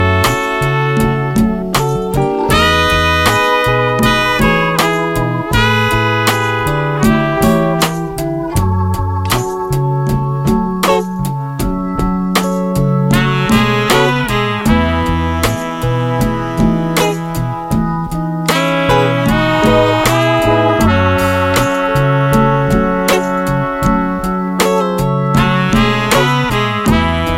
Instrumental

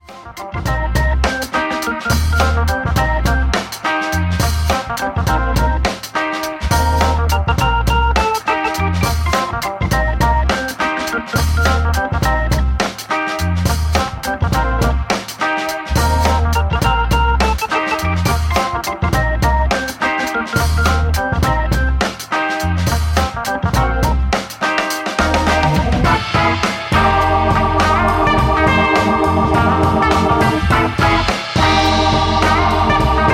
Organ Trio

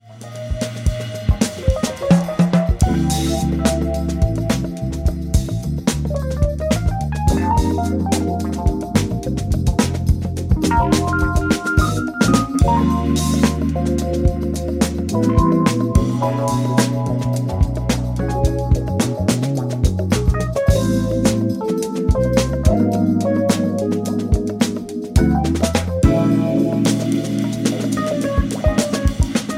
Drumatix

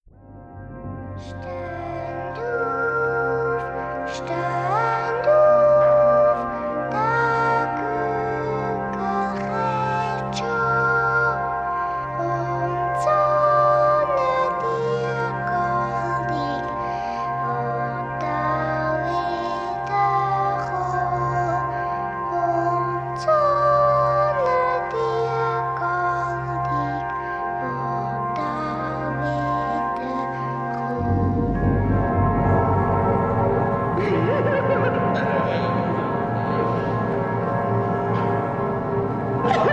Electronic

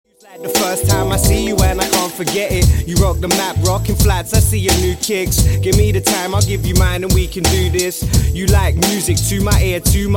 Jazz Music

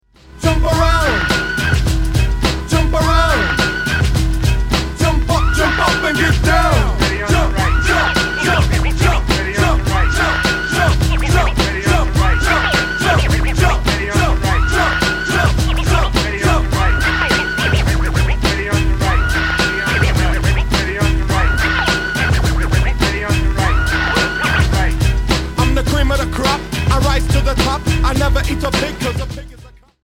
Vocal

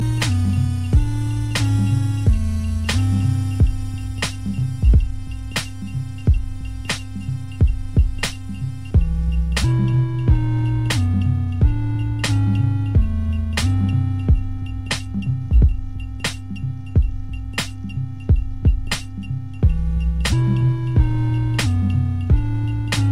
Instrumental

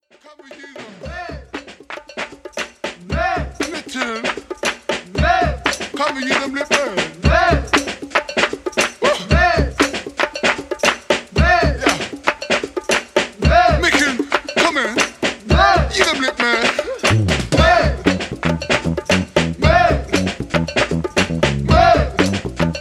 Dance Band

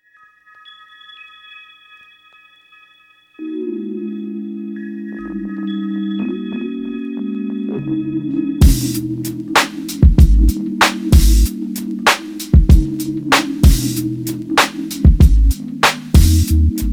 Instrumental

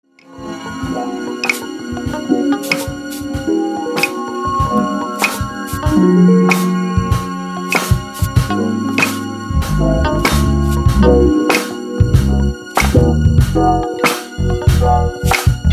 Brazilian Groove Instrumental

